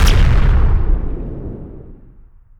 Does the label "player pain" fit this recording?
No